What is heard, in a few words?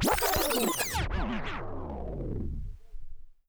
back spin long, vinyl record